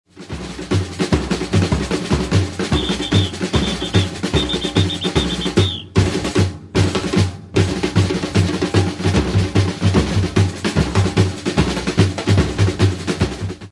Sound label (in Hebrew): Whist Trio, שריקה משולשת; Batucada